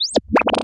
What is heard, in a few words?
laser; lasers